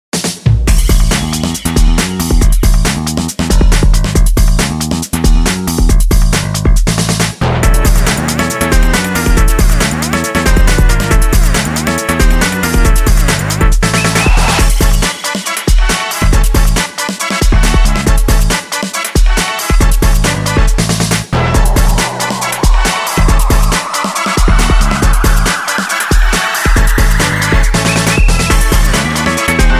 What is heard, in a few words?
Funny Funky Freaky